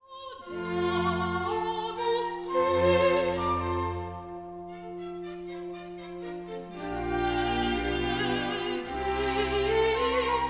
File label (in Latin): Laudamus te Soprano